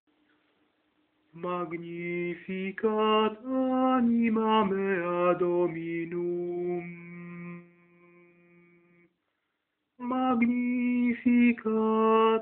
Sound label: Magnificat sopran